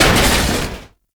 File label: Metal Crate Destroy; Gameplay; Warframe; Tau; Destructible Dynamic